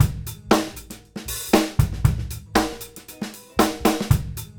groove, Grooves 99-132bpm

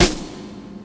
Snare, One Shot Drums; Drum & Bass

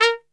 A# 2 X PIC SHT, Brass; Best Service; Brass Super Section

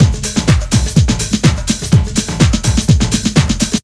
thunderbird, Deep House 125bpm

